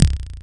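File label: bass drum, right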